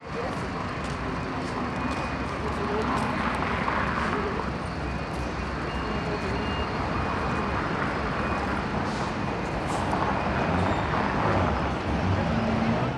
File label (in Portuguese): Passos de Chinela em Area de Transito; em Brasilia